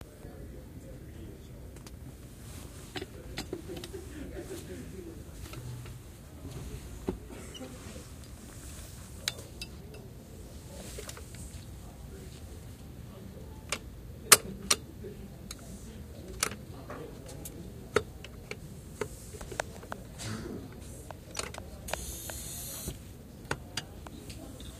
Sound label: pneumatics